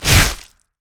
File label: basic attack claw; rengar